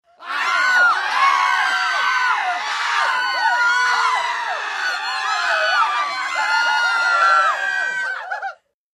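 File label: Crowd Panic Aaaghs Screams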